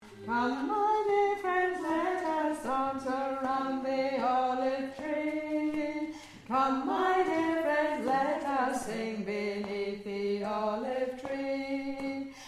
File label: Alto Chorus